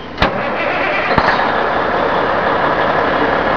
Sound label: truck start